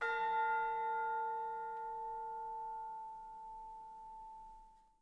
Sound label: F5, Percussion & Harp; Miroslav Vitous